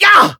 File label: pain; Snork Gunk